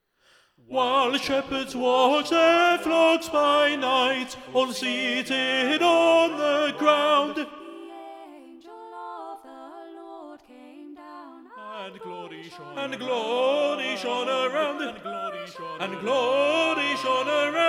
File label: Tenor Up